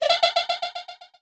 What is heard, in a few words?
Attack FX